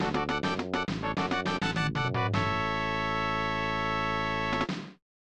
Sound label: Jingle; America Oudan Ultra Quiz; SNES; Unknown Jingle 3